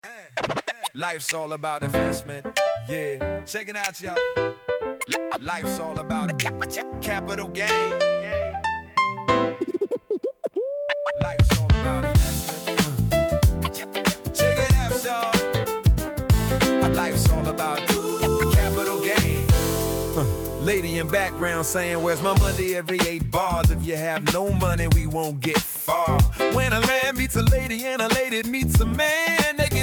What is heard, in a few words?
RB Jazz